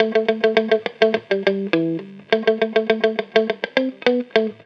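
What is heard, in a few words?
guitar, Roots Funk; USB Soundscan vol.41; AKAI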